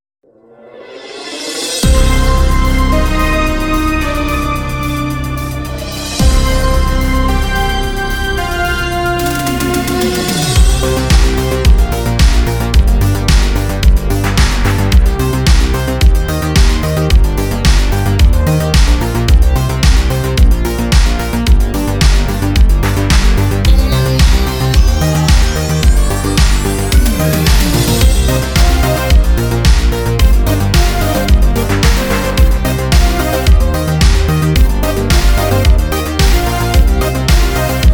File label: New Retro Wave, retro synth wave